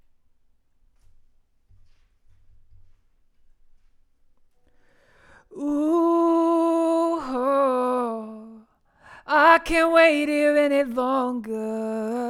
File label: Vocal